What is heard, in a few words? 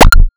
Star Instruments Synare PS-1